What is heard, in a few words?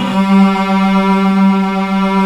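Cello D; AKAI S-Series CD-ROM Sound Library VOL-2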